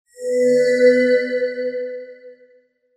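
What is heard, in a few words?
magic disappearance; Короткие звуки для монтажа; transition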